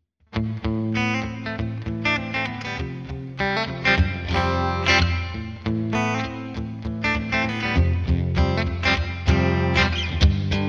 Twin Clean, Clean; P90

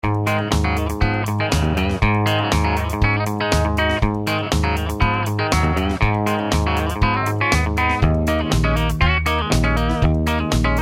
clean bridge, Tele; schwarzbach; VP-Tele-57

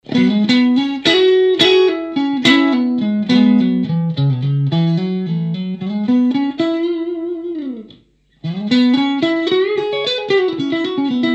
66 clean neck, Strat; schwarzbach; VP-Strat-66

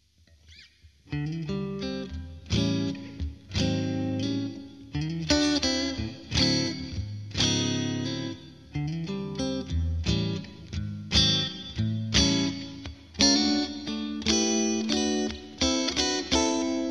Neck Mitte Twin Clean

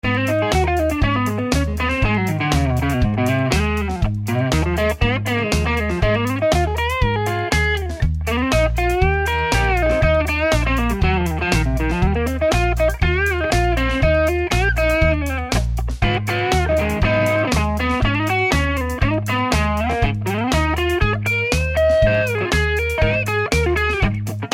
crunch neck, Tele; schwarzbach; VP-Tele-57

